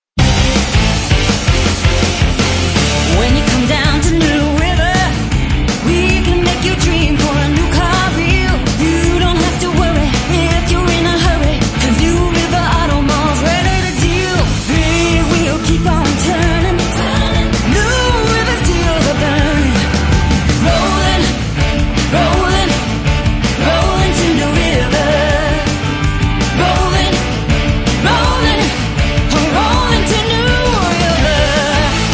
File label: jingles